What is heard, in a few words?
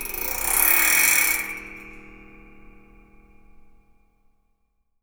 SPRING, Definitive Percussion; RECO